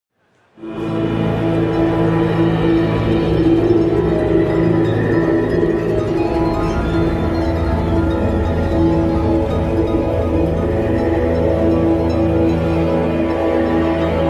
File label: Music; Nazmir; WoW Battle for Azeroth; BlizzCon 2017